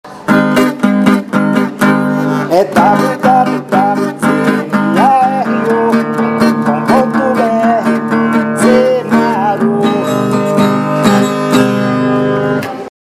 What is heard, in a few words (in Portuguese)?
vinheta cantada